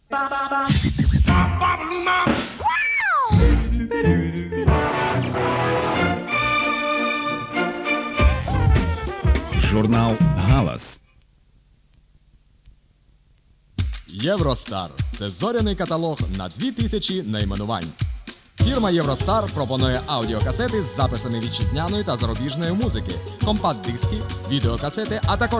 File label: Jingle; Нічний ефір; ГАЛАS